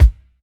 warm kick single shot b key

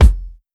mid heavy bass drum one shot F key; drum single hits one shots; kick drum samples

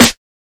00s small reverb snare drum sound d sharp key

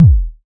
bass drum one shot f sharp key; kick drum samples

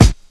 rich highs steel kick drum sound a key

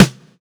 puffy snare sound D key; snare drum samples

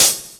subtle high hat sound g sharp key

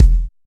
kick e key

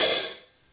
subtle reverb open hi hat one shot e key

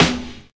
snare b key

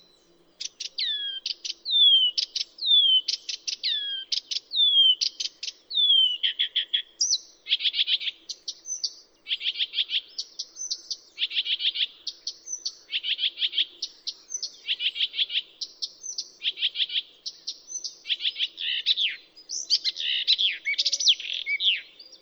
blyths reed warbler song; soundscapes

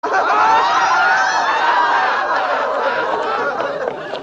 spectators laughter

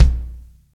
perfect steel kick drum sample a sharp key

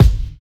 present kick single hit c sharp key, kick drum; kick drum samples

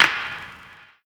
clap sound g sharp key; percussion; single hits one shots

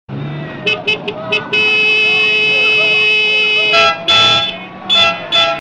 high pitch horns

